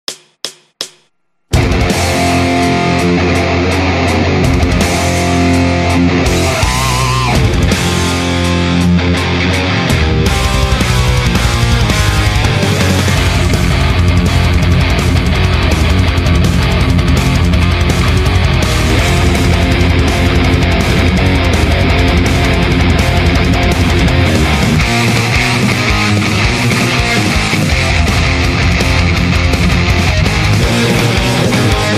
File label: valeton gp 5 high gain; valeton gp 5 super compact multi effects